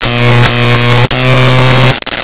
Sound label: pulse hit wall, weapons